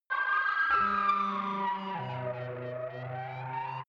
far fighting racer, raumschiff

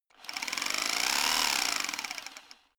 rock drill chisel short fast; maschinen; schrauben drehen hydraulik

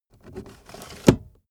car accessories cup holder cover close slow; autos; verkehr transport

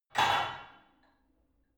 barbell only replace reverberant hard; sport freizeit; fitnessstudio

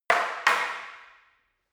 staff wood fighting double; war weapons

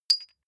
medium metal impact medium high

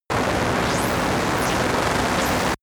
facility damaged severe, sciene-fiction

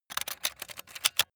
metal small spring handling fast; industrie wirtschaft; metall

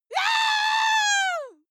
female lunatic scream attack high pitch; menschen; schreie

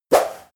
whoosh short tonal; swooshes-swishes

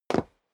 step sneaker wood parquet single stair up; schritte

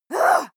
female shout upset short; menschen

